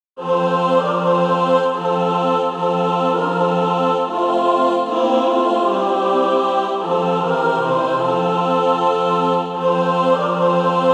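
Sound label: mixed, Practise Music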